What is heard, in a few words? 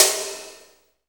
DX MIX SD; REMIX 3